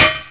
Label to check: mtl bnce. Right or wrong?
Right